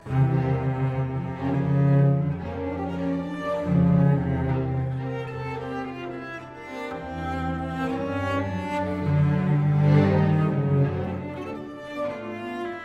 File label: CELLO; Concerto en la mineur de Schumann; Reine Elisabeth